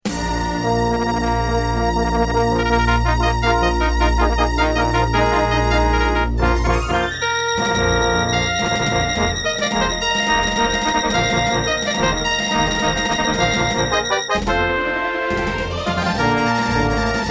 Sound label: Intro, Hizon DB333; Dark Forces